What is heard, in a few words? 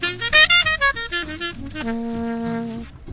on sax; MELODIC SAMPLES; HORNS SAX TRUMPET BRASS